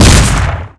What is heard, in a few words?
grenade explode, weapons